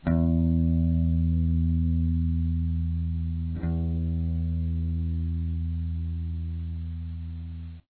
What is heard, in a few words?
string low e, guitar